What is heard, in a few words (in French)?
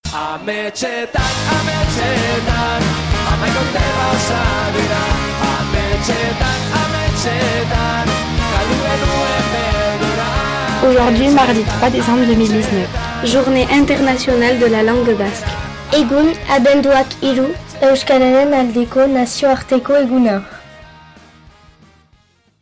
Sonnerie